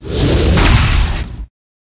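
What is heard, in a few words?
Dragon Skeleton bot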